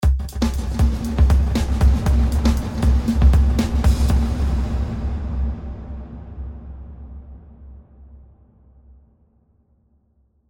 BIG HALL, impulse responses

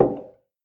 footstep metal; Pitch Black Audio Pong

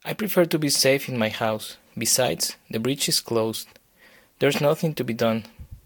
guitarist; DeadFright

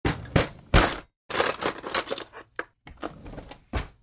Silverware Drawer Remove Item, Kitchen; 1001 Sound Effects

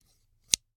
Scissors, snap, snip, shear, large, single; Eiravaein Works; Vaeyan IV

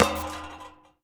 Street Ball Metal Pole Hit; SFX Kit; Street Basketball

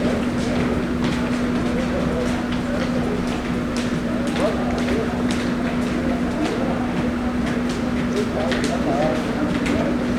trainstation human; best service Blue Box

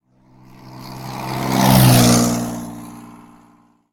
car; Blind Drive

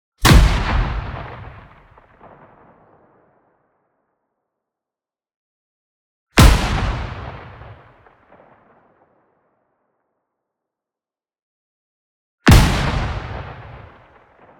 EXPLOSION SHORT Distant Blow; boom library; Anime Essentials

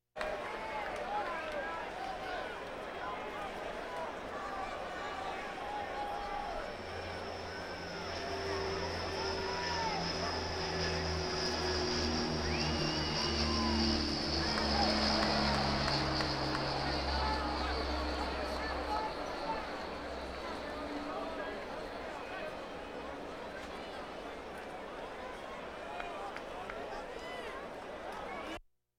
Crowds Race cars Baseball

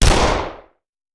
Machine Gun; Sound Ideas; Guns

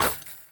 Street Ball Chain Swish; SFX Kit; Street Basketball

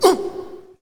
Hockey Male Hurt; SFX Kit; Hockey Vocal Sounds

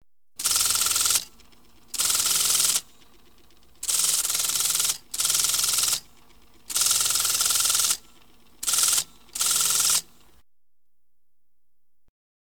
Audience Applause

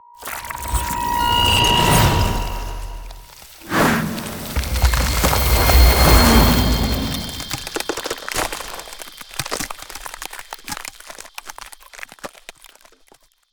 Ice Spell Barrier Defense Frozen Sanctuary; Arctic Aura

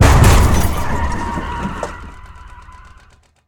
hit car; Blind Drive

